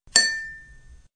Correct Answer; the impossible quiz

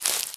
Grass footstep; starbites

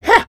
Attack; Sly Attack

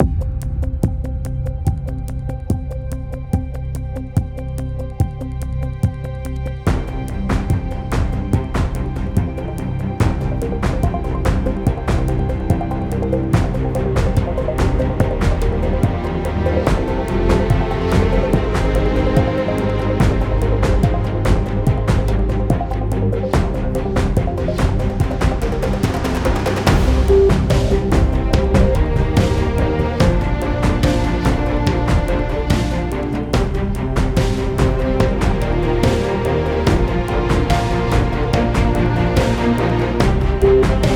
Music Pre Race 72bpm Game Mix

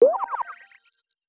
Swim

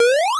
Special Photo Powerup Platformer Jump; Sx Special-Photo Powerup-Platformer Jump-2 Norm 01

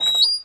Button Sign Click; Dino Donkey Dash

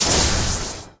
Electric Spell Weapon Charge; Legend of Keepers (gamerip) (2019)

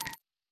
Equipment Open Item List

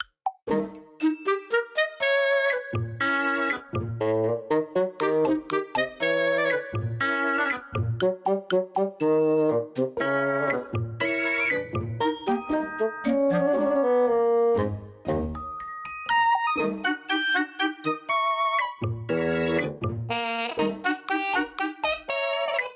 Story Loop

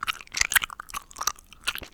Zombie Eating Chewing

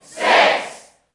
Sfx Basketball; Sfx Basketball Breaker

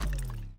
Holo Mural Power Off

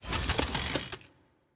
Pistol Hero Head Moove; Legend of Keepers

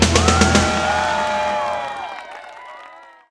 Jackpot

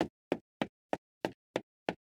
Sentry Footstep Loop; Hollow Knight; Ruin

